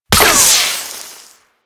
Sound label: Heavy Shotgun Projectile Fizzle; Weapons; Warframe; Tenno